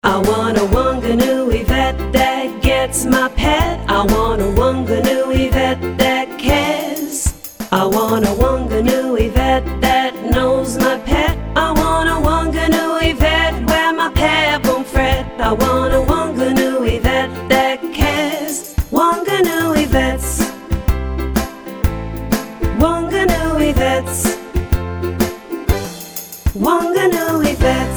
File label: full sung; wanganui vets